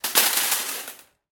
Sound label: impact thin; sonniss gdc